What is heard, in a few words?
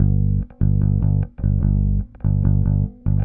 Bass, Indie Rock